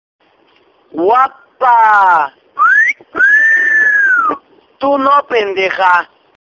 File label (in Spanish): tono de llamada de silbato